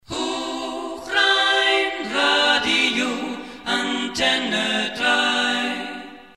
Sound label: Acapella; Hochrheinradio Antenne 3; HRA3